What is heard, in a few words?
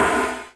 IND